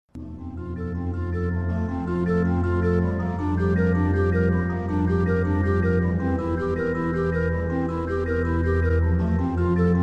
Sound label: Relaxing Ringtone